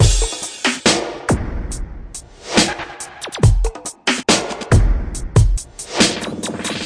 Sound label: 140 space break drama, drum loops and breaks